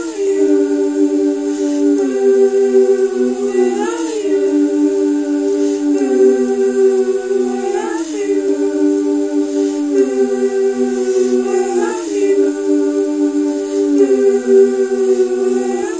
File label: where are you vocals, Folksy Guitar and Voice Stems